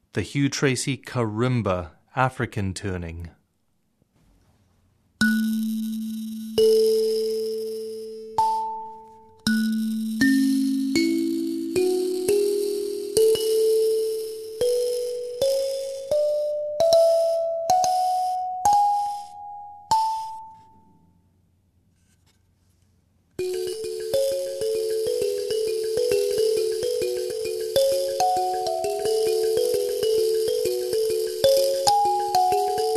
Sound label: Karimba African